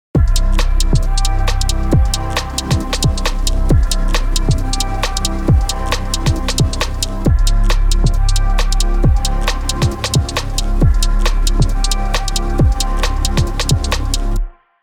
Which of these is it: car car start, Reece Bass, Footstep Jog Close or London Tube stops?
Reece Bass